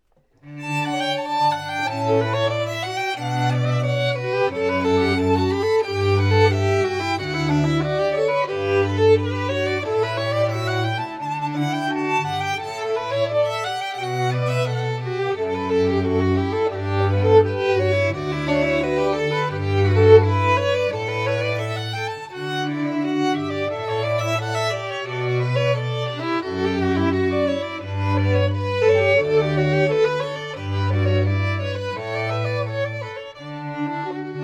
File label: strings; classical musicians